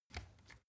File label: step ladder wood single